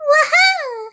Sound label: yahoo; sm64coopdx; char-select-extra-chars; birdo